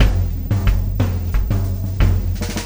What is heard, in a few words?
Beat, Loops